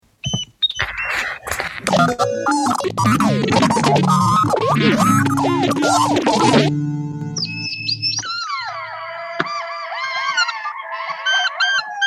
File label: noise jam